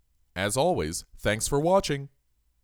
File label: Percussion; Ab Percussion